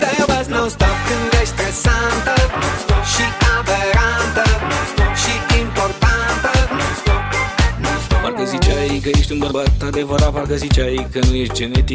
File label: NonStop Funky